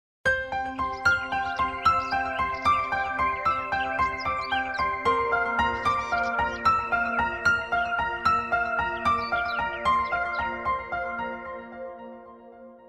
Morning, alarms

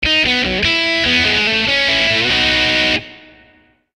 Punk alarm; Nokia; Audio themes

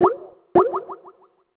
incoming call, Skype WiFi Phone; Netgear; SPH101

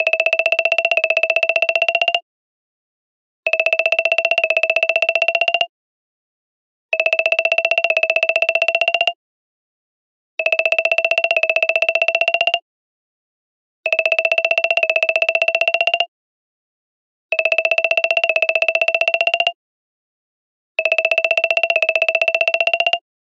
Signal; LG; V50S

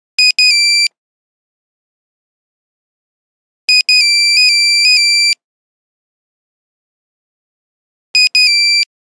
Nokia Buzzer City bird; Windows Phone apps

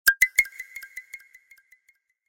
Echo

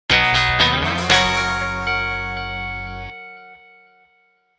Message, Digital; Nokia C7

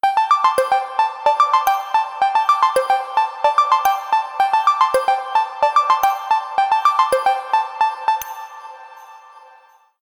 Flow alarm; Fujitsu; Arrows-X-LTE-F-05D; alarms

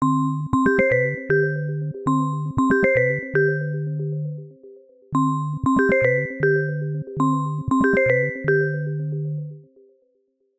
Galaxy Bells, ringtones; Samsung Galaxy S23

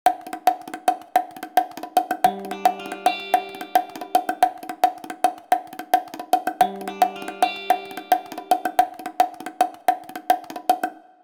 drum, ringtones; OnePlus 3T

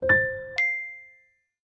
Light House, phonetones notifications; LG; V30 H930

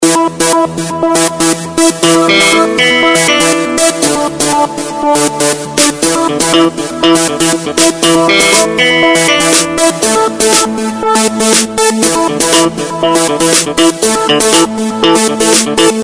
Groove, ringtones; Okapia Air

